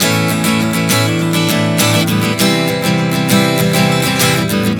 Progression 100 A-C, Acoustic Guitar, Chord Progressions 100 bpm